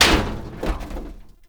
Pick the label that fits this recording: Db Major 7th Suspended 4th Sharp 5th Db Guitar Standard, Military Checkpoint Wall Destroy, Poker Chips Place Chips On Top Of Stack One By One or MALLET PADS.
Military Checkpoint Wall Destroy